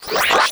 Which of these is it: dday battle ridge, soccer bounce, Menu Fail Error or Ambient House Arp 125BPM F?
Menu Fail Error